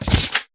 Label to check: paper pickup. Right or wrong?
Right